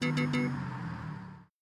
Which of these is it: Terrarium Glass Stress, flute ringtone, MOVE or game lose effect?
game lose effect